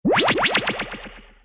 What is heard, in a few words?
icecream laser